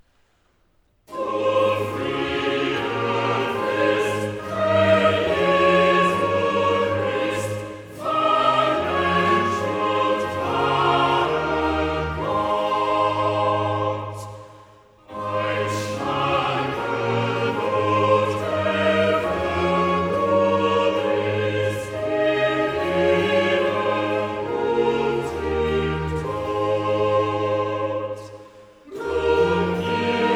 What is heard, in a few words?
Choral; Cantata, BWV 67 'Halt im Gedächtnis Jesum Christ'; 'Du Friedefürst, Herr Jesu Christ; Bach; Arnstadt; 1st Sun After Easter